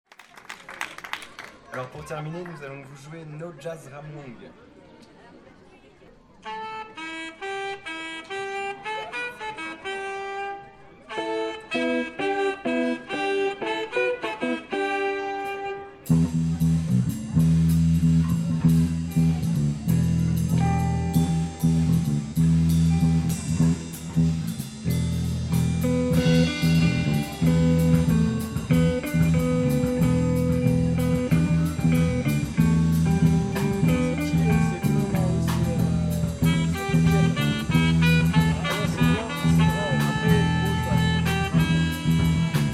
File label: jazz ramwong